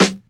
Modern Natural Snare G Key, acoustic snare sound tuned to the G note